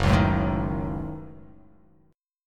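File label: Gb Minor 6th Gb, 8 string; strummed; JGuitar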